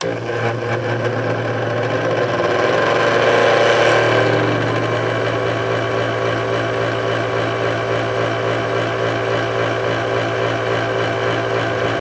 Grinder Motor Strt R

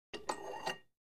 Free Weights Exerci

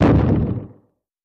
Rock falling ( cartoony ); rocks

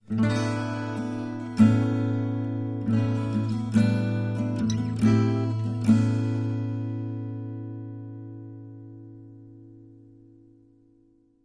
Acoustic Guitar Romanti

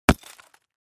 Concrete Chunk Hit

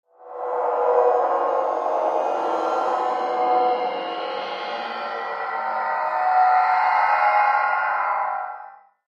Feedback Terrifying

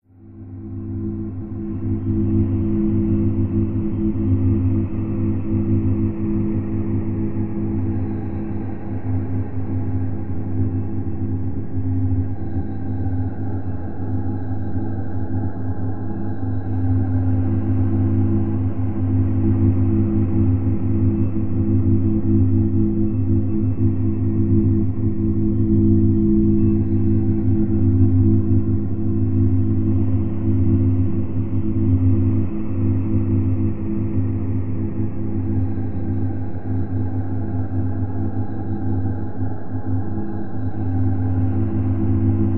Low Distant Vocal, deep

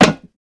Tin Can Metal Crinkl

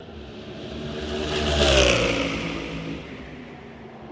Camaro Pass By, chevy camaro

